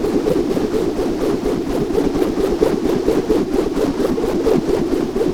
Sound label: lasso spin fast loop; greed; spinning lariat